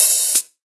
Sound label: dark open hi hat D# key